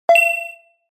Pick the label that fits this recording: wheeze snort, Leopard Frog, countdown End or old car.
countdown End